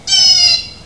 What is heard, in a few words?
bluejay; natureNavigator